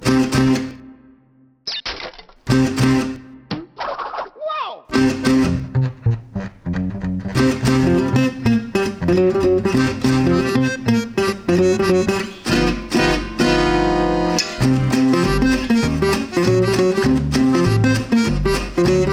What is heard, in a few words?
Swing; Crash Bandicoot